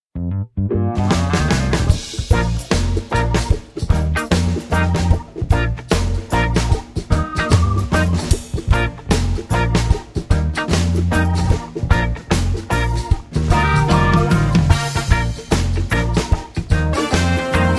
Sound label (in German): ich bin von innen aussen oben unten playback